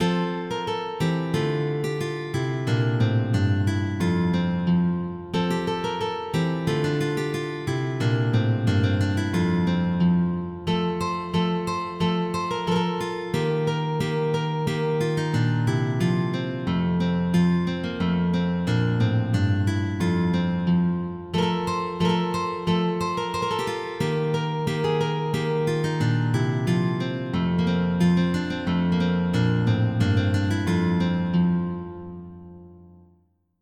Jig; Kemps

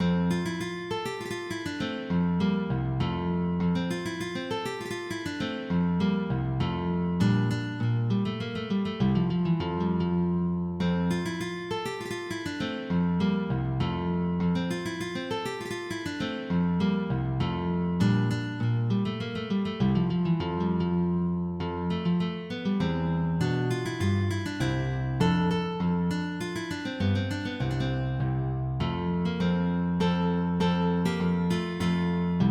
Polonaise, MIDI

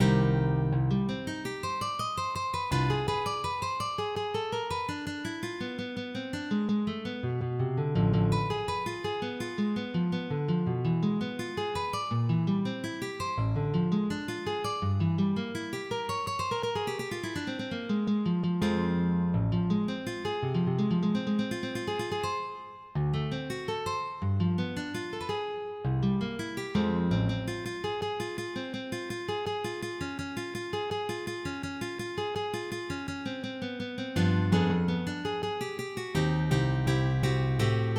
Suite en Do mineur Introduction, MIDI

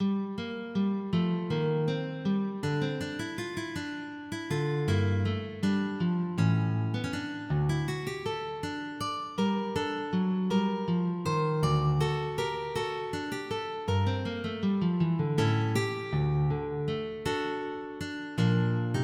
Courante; Ballard

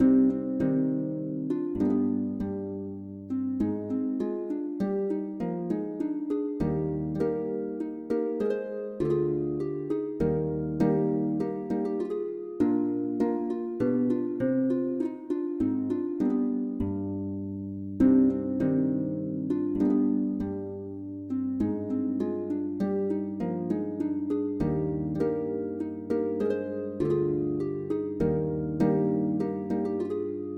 Sarabande Duet

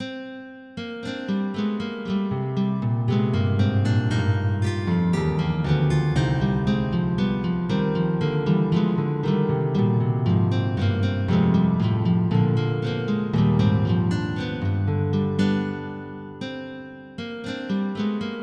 Gigue; La Belle Angloise

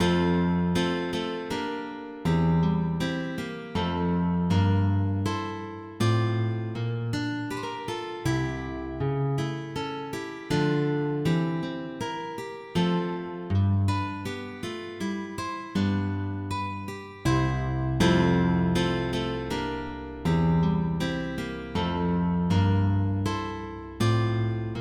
Sarabande, MIDI

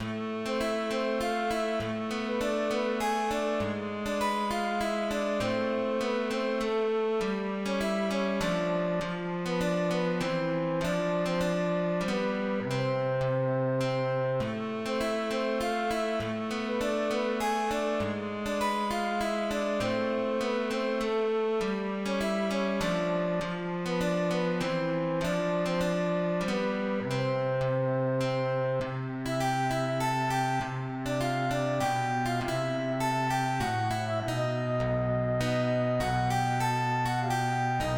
Trio, MIDI

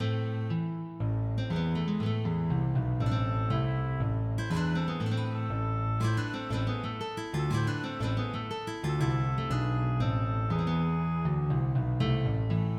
Sonate à Trois, MIDI

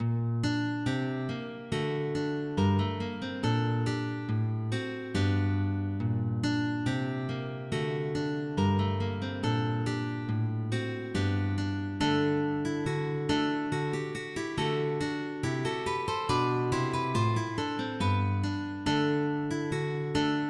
MIDI; A plaine song

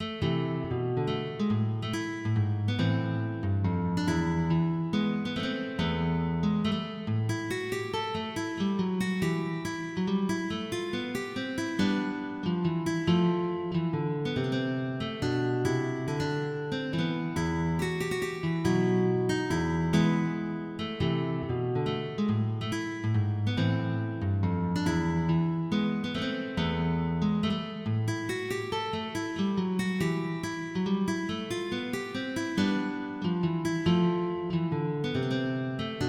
courante; Les Larmes

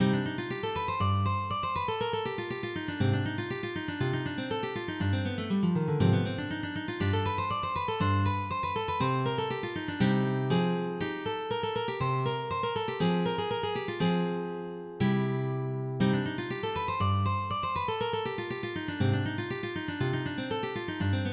Ricercar, MIDI

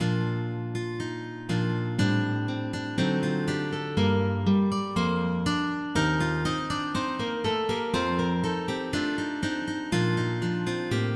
MIDI; Matanyas Jam and Honey

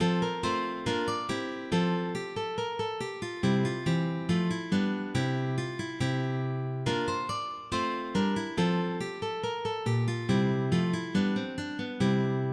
Chorea Polonica

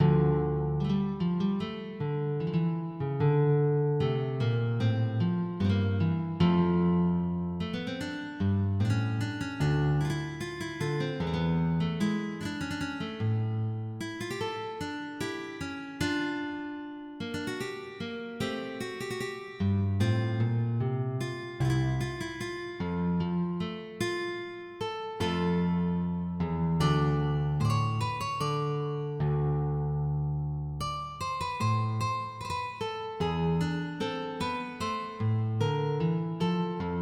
Gigue; du vieux Gaultier

